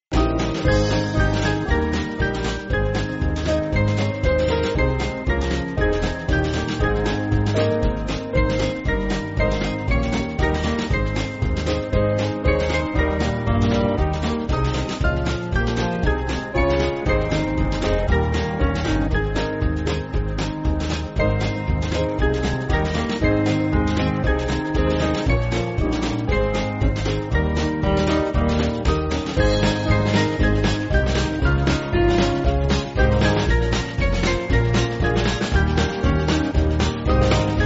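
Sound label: Band, Music Backing; Buckley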